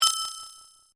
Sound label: RING ECHOE, User interface feedback sounds; Tone Sound Effects; Tones (Sound Effects:Sound Design)